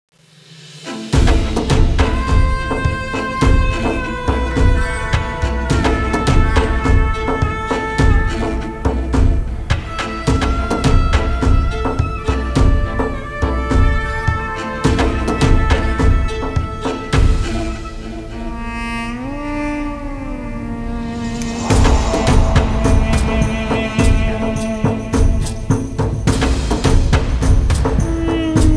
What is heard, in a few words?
melody with beat